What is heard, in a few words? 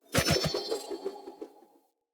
Throwing Knives Projectile; Pothia